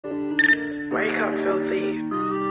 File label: calm wake up calm sound